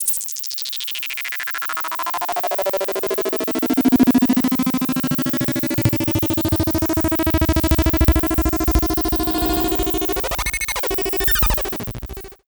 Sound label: ELECTRIC, Special FX Twisted; USB Soundscan vol.14; AKAI